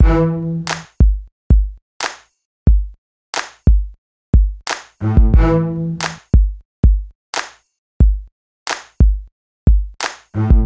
Hiphop beat

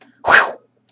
Short Wind Noise

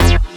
Synth

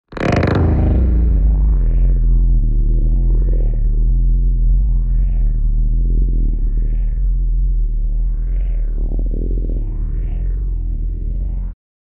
Political Stab Drone

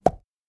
Mouth Pop

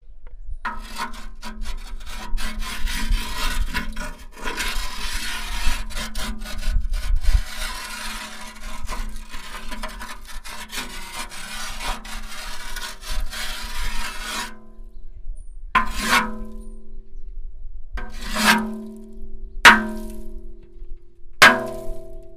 Scrapping Rust

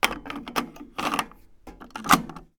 Inserting Casette Into Tape Deck

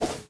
Swoosh

